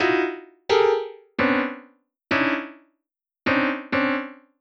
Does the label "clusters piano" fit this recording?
Yes